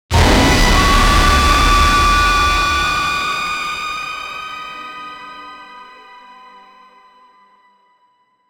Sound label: prompt intense horror, sting